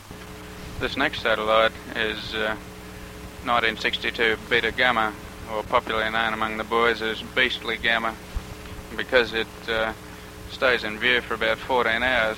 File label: Satellite Sounds; Explorer 14